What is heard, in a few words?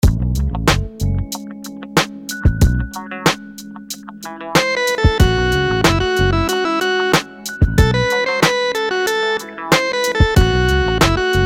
rhythms dotted 8s